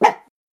maltese dog; animals

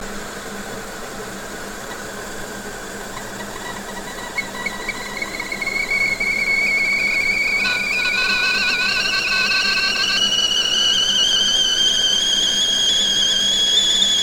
kettle boiling; cooking

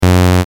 blip

